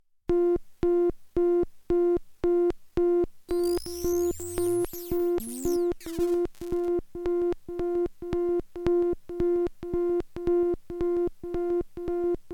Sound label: delay